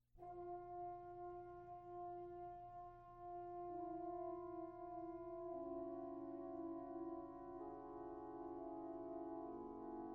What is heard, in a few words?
Music For Brass Octet, Very Slowly